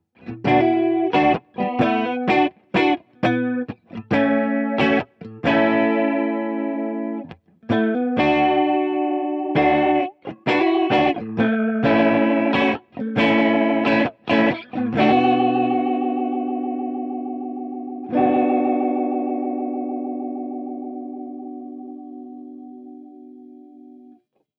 Chorus Roto; Beetronics Seabee

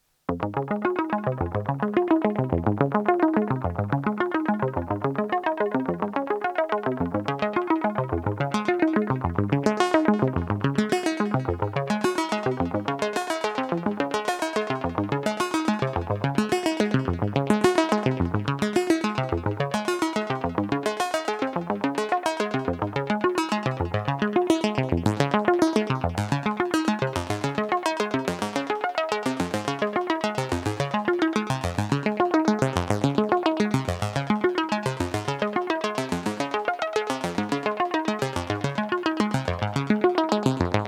ARP1601 VCF

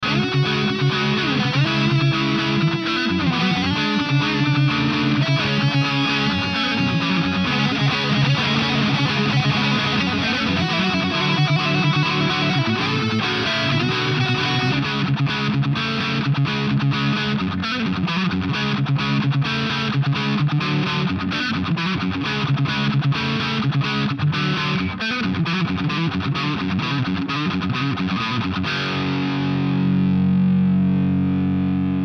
Mooer GE 150 British

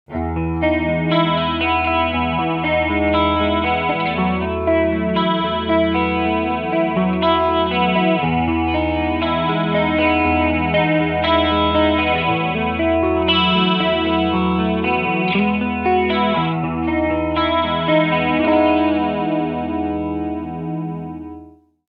Smooth picking

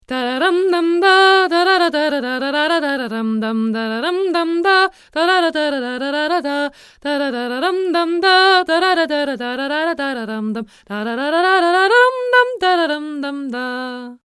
sE 4400a H2 far

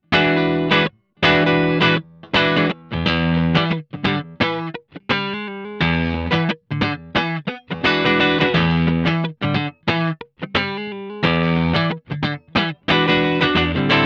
Capturing Quad Cortex Clean; Profiling versus Capturing

